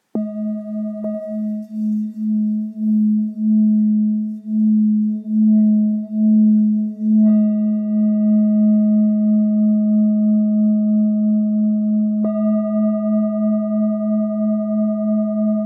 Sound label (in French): Bol full moon 750g si